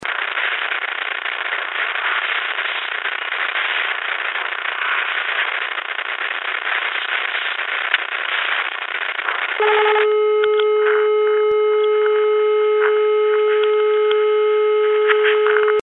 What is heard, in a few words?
phone